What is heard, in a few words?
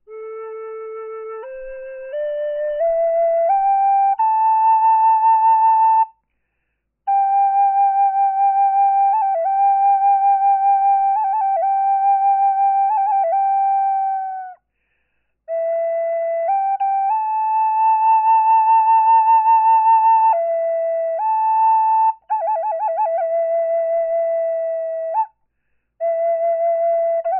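natural, wood flute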